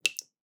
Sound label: Drips